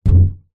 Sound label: foley balloon large inflated impact